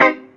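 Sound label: guitar chord C minor, roots reggae; Sounds Good - Roots Reggae; GTR CHD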